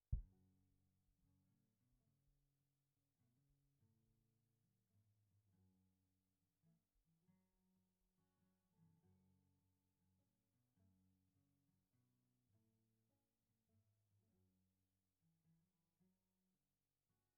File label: Kick